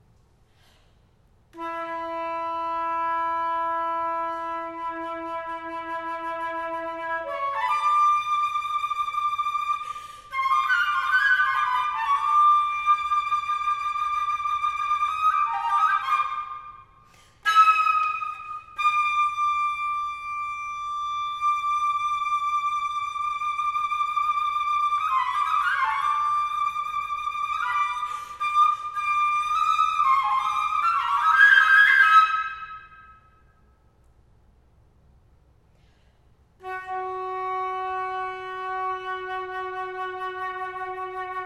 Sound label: Etude No5 for Solo Flute